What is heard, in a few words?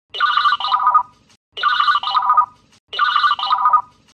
defy, motorola